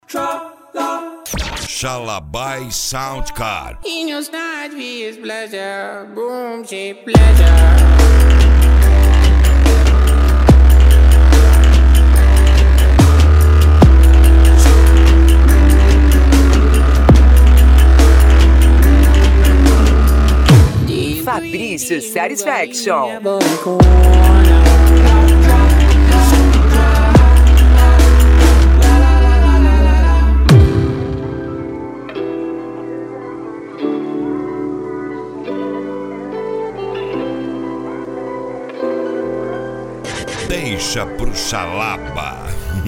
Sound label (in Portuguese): sound car mala aberta e mega funk